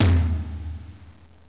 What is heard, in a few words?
tom